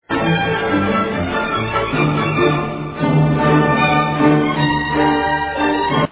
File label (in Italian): allegro molto